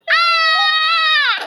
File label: scream; bluey